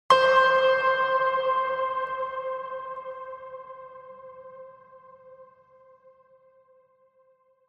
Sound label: Trailer Tools Piano Ping; Production Music; CATastrophic